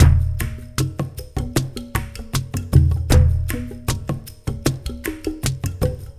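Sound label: ETHNO left; Spectrasonics; Liquid Grooves 1 & 2; AF.PLANET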